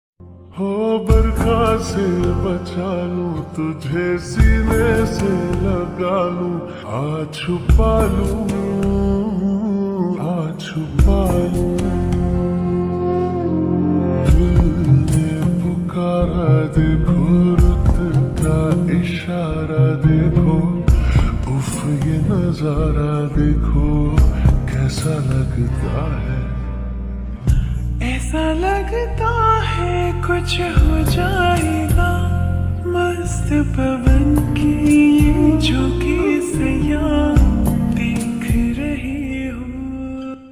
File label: Ringtone slowed and reverb